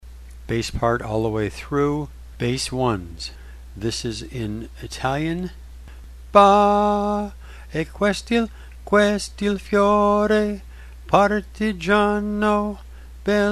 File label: Bass 1 - Italian; all the way through; in Italian; Bella Ciao